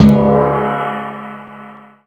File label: Acoustic Percussion One Shot